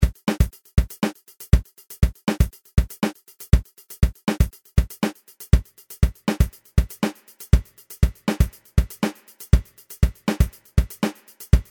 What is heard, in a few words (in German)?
Impulshall Mechanisch Bad Beat